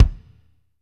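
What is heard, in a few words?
KIK kick, Ballad; Northstar - Drumscapes Roland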